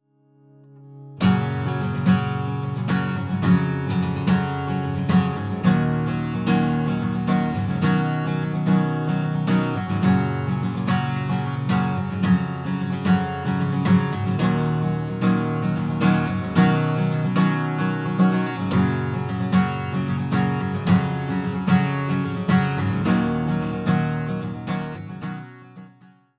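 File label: guitar strum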